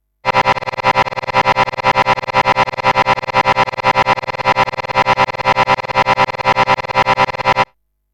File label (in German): Granular synthese Retrigger; Emu EIV classic und Ultra; Stage 1; Pulse 12